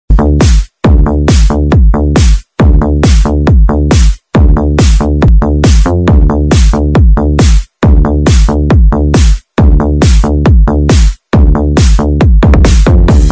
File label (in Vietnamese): nhac nen to chuc tro choi vui nhon